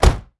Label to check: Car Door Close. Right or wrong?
Right